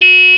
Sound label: klaxon